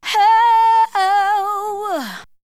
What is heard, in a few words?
Vocal; ZERO G - Datafile DISC 1